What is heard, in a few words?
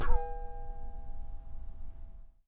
Wop, Japanese pull saw